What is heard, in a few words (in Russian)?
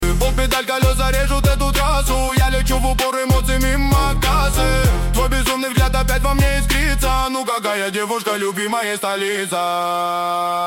type music v pol pedal